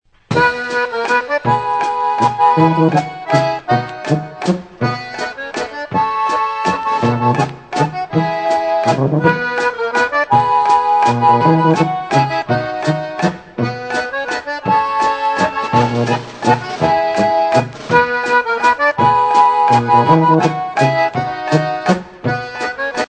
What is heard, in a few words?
waltz